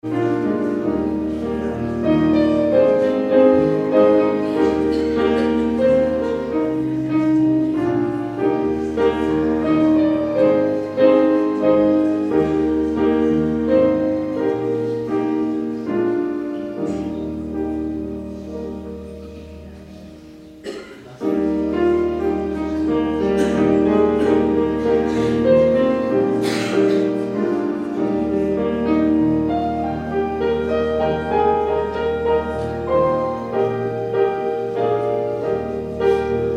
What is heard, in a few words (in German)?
Vorspiel